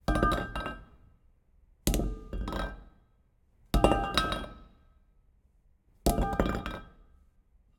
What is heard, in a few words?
Gym, Foley, Drop metal handle on floor; Borg Sound; Gym weights